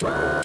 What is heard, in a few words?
droid servo